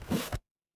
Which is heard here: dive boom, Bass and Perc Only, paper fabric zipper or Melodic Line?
paper fabric zipper